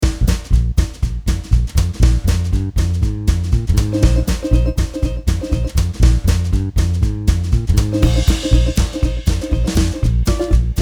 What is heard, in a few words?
Blues; Delta Gamma